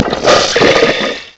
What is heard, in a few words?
Flush